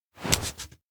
baton pass kids; anemoi

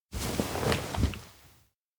lay down; yomo; anemoi

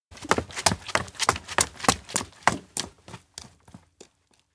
steps outdoor rebuild town dash rain; anemoi

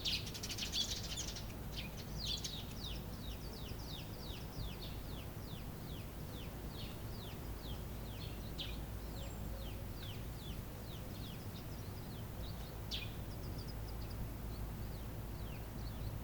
outdoor morning birds; anemoi

